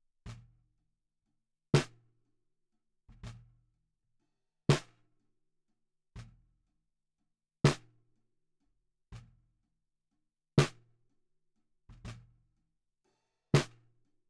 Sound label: SNR Bot